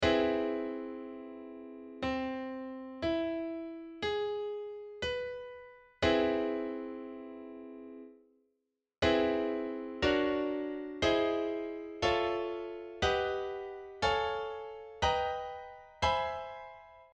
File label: aug major seventh